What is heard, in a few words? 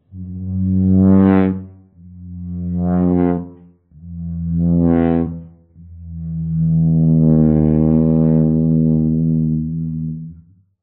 trombone; vapesquared